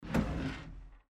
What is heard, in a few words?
wardrobe